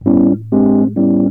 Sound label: Rhodes, Keyboards; Total Drum&Bass